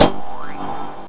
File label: Power Off Cheerful; Samsung